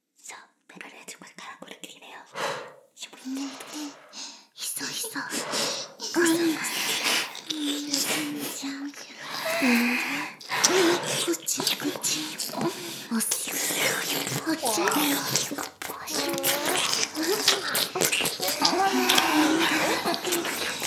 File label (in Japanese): 耳舐め＋しこしこ＋フェラ; LR逆ver; まとめてＭｉｘ; 淫妖精の森